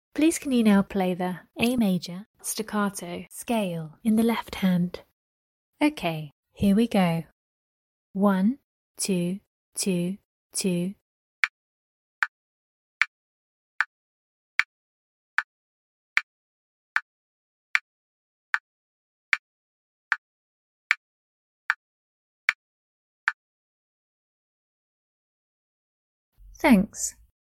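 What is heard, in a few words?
A major staccato scale left hand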